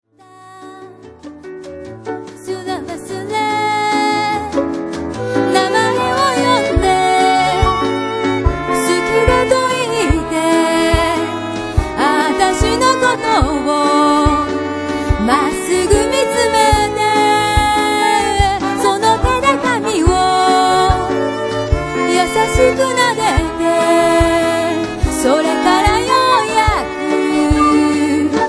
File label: bossa